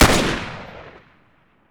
m14 fire, weapons; garrysmod

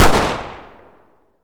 glock20 fire, pistol; garrysmod

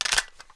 g3 mag in, weapons; g3a3; garrysmod

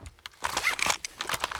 rpk new mag; garrysmod

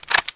tar21 clip in, weapons; garrysmod

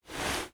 move, sg550; weapons; garrysmod

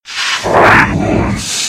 backstab; freak fortress 2; nightmare

